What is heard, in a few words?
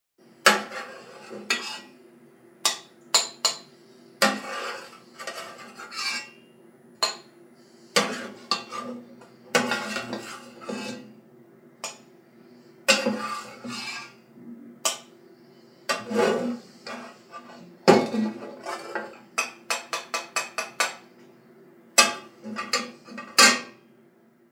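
Food being served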